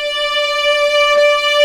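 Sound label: viola D5, ARCO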